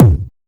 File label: Distorted Bass Drum; Yamaha PSR E305